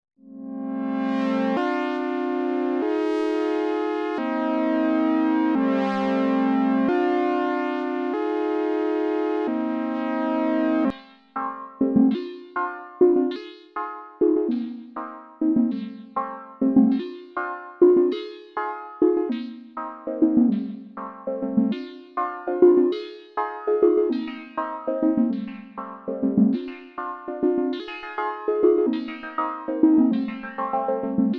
synth downstep rhythmic var

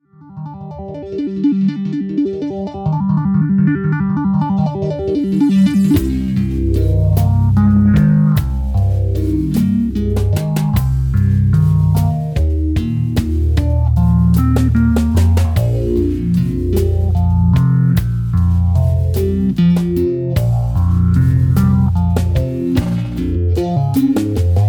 Bass; PH-3

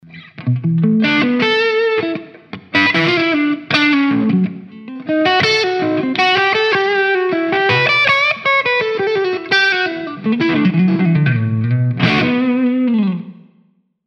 Slow Hand Bluze

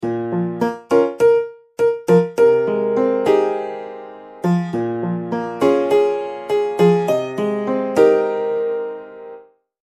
Tack Piano

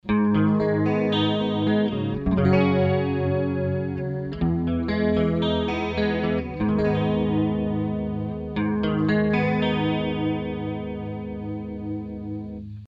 Med Voice Med Speed Chorus; Empress

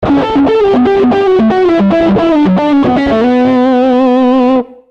Metalic Shrine

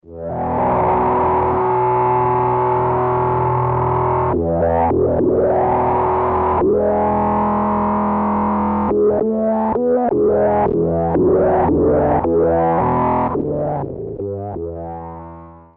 Microsynth Mania

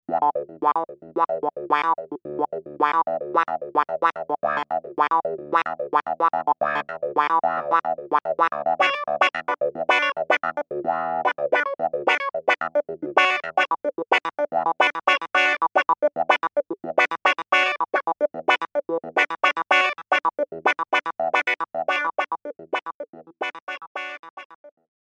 Clav Wah

